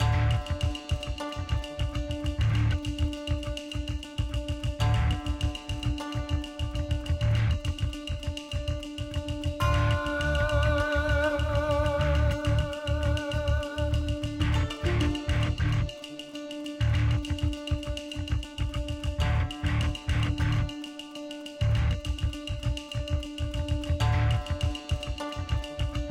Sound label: Combat Low Single